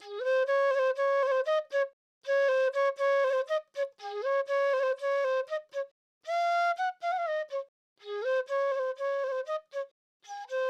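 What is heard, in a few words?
Flute C major 120 BPM, Dancehall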